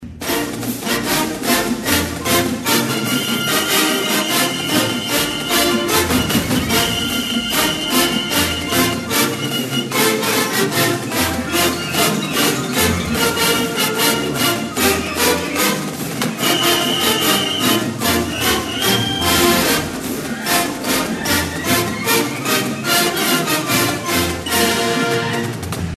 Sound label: fight song